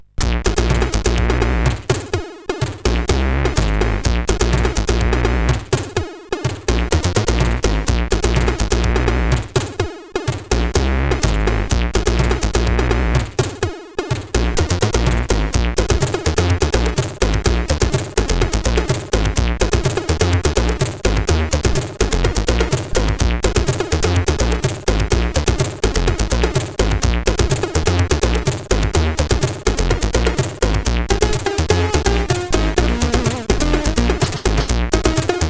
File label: My Funky Beat sid CSG8580R5